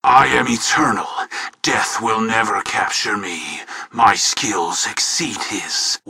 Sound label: battlecry; tf